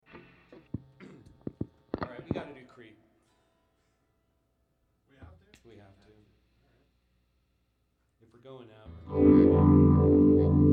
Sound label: Guitars Mix